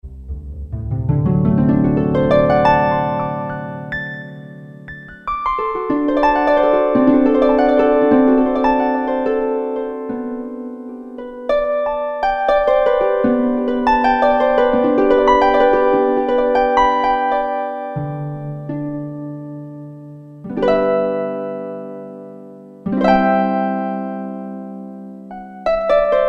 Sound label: PL Harp, Yamaha TG77; Factory Presets